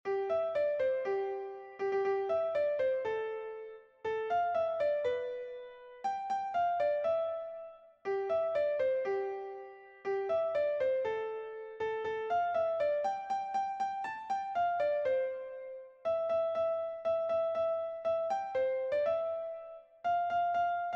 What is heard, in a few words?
Jingle Bells Piano